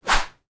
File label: start harvest; rts tutorial